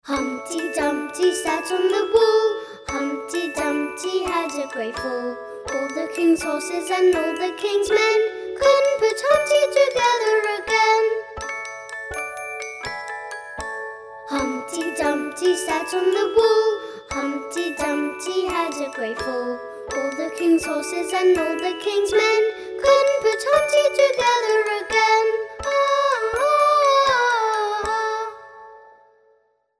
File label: humpty dumpty, musicbox